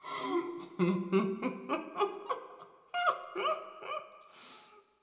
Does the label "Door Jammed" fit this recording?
No